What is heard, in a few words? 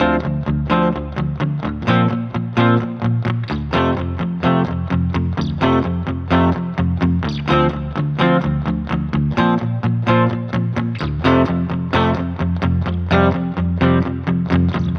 Electric Guitar Loop 128BPM Dmin; Leads; Ghosthack